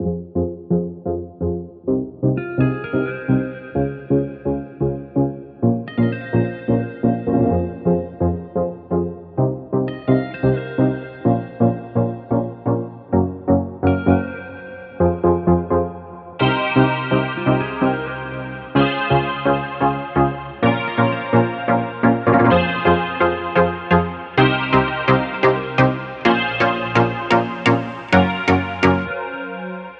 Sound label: Chord Loop, 128 BPM F Min, Synths; House; Chord Loops